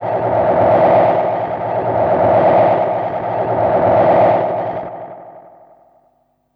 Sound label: Tape Delay Feedback; radiosonics